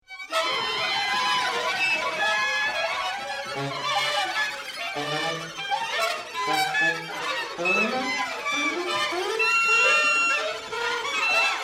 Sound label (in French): Orchestre